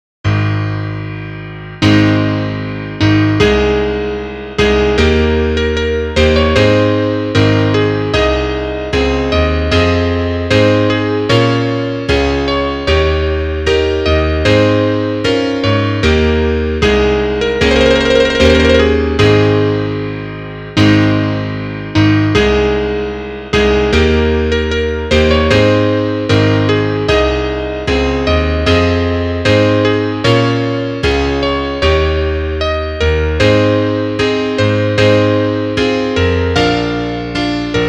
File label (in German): Klavier Solo, trompete; Aus der Feuerwerksmusik